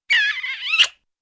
squeaky kiss

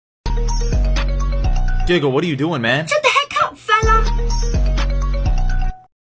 giggle what are you doin man

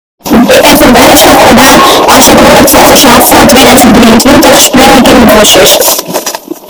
ultra bass boosted

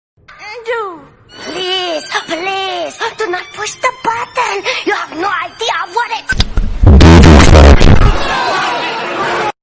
doo doo farty

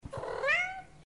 miau

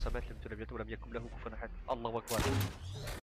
rpg launcher arabic

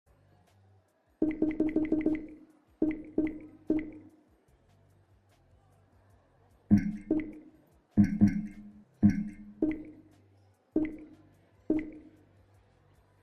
steam vr menu

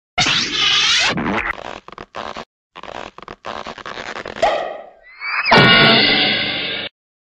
logo

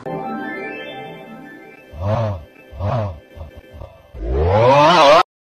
haa hoaaa

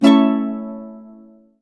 spawn music; slime rancher

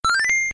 throw; smb2

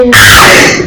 haaaaaaaa

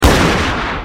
pistol firing; dishonored

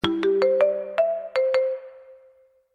jingle; renfe

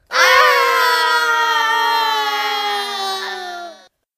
screaming; bfdi; alliance

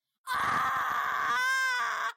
ahhahaha

